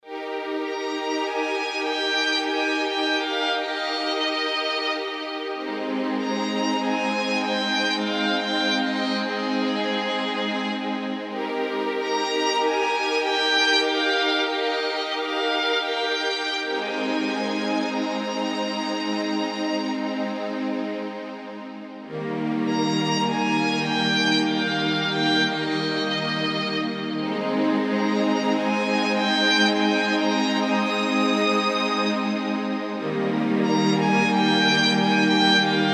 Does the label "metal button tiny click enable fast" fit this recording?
No